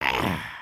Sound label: Walk, creatures organic